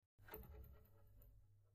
Antenna Oscillating

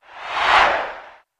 Football Crowd Dopp, sports arena football

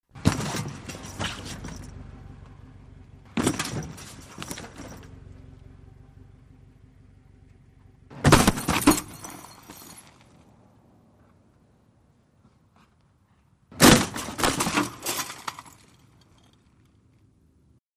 Suitcase Crushed By C, plastic

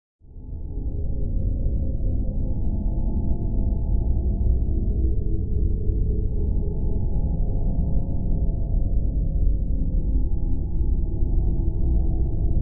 Ambience Modulatio

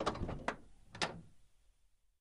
Camaro Door Open

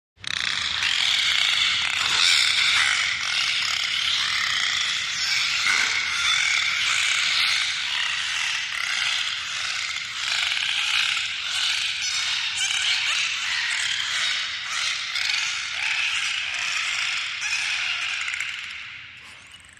Dolphins Vocalizing

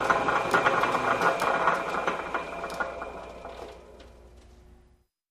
Gears Turning Rattl

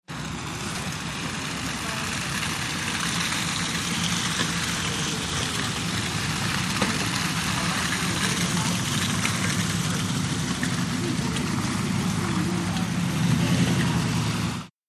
Wet Traffic Ext BG

